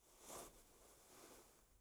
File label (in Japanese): 布ズレ